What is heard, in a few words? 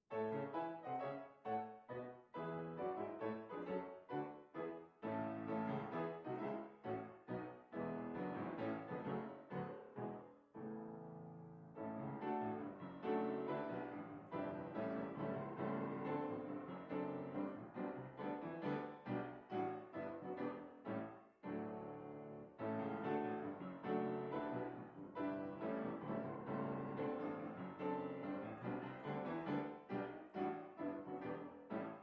Piano